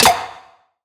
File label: Snare - F; Snares; Future Bass; Drums; One Shots